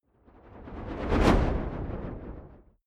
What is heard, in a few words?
fire whoosh flutter fast; swooshes-swishes